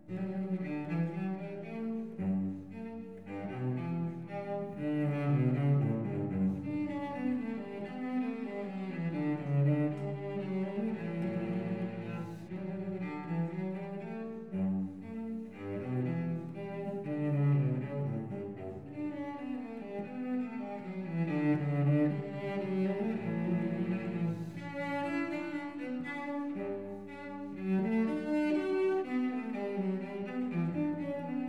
cello Gavotte II, I; Bach - Cello Suites; Cello Suite no. 2 in D minor

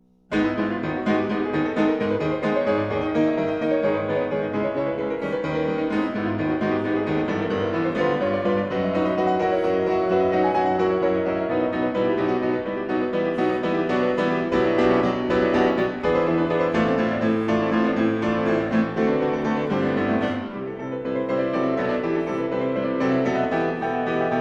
En Sol Diese Mineur Presto, Piano, Solo, Preludes; Chopin

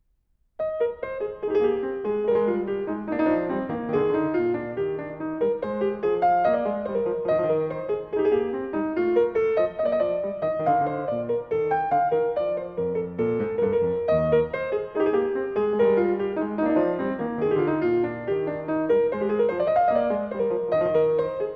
Gavotte

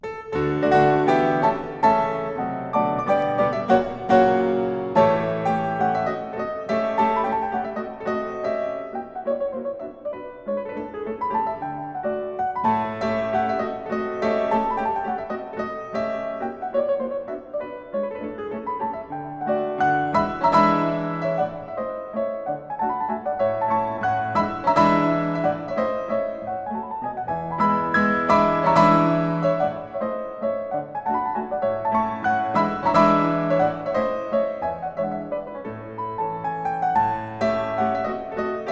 Mazurka In D Major, Piano; Chopin

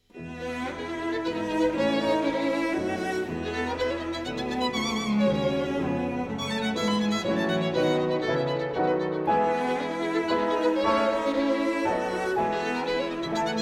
Piano Trio in A Minor, Var. II. Piu mosso; Tchaikovsky Arensky

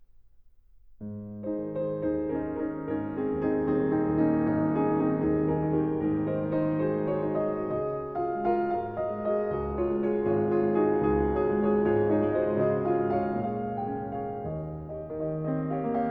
Etudes, A flat major, Piano; Chopin; Trois Nouvelles Etudes

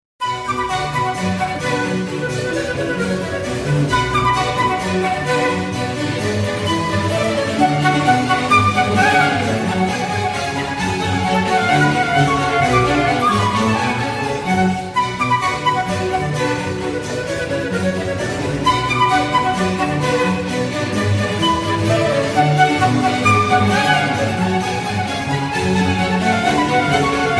Orchestral Suite No.2 in B Minor 'Badinerie'; Bach

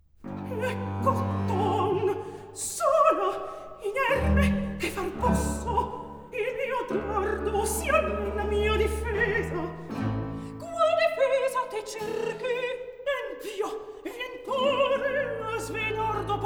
Recitativo; Atto II - Scene 12; Griselda, Ottone; Ecco Otton. sola, inerme, che far posso

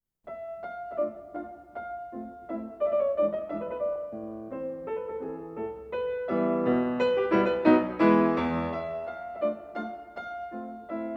Mazurka N 6 in A minor, Op.7-N 2, Chopin Piano

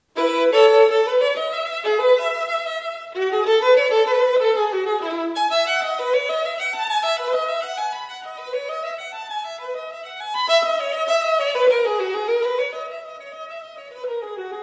Partita No.3 Bourree; Bach; Szeryng

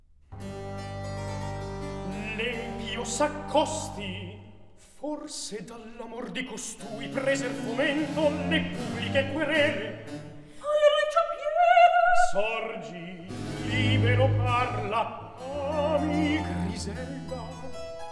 Recitativo; Atto III - Scena 6; Gualtiero, Ottone; L'empio s'accosti

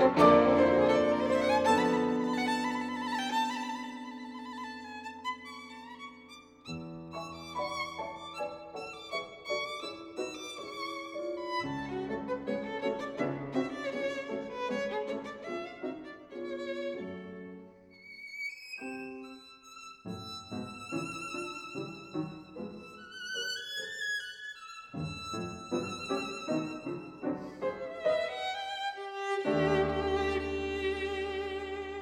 Rhapsody No. 1, (Agitato), Violin And Piano; BB 94a (Alternative Ending); Bartok - Works For Violin And Piano

